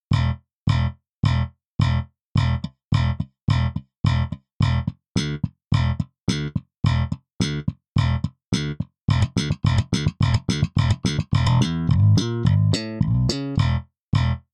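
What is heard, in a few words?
Bass, default setting, slap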